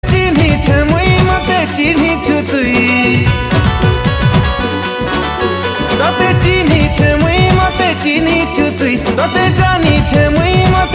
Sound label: Sambalpuri